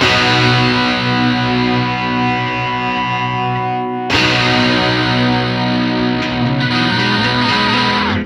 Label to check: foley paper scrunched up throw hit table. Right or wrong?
Wrong